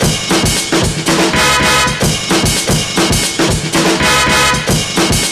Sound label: Kit, Breakbeats; Head Spins